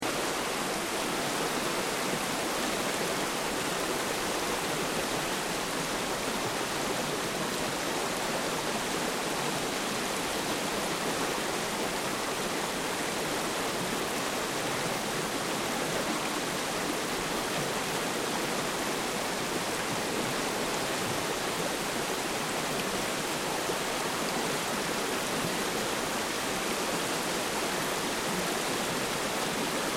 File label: Water Stream Fast Rocky Distant Loop; Elemental weather effects; Nature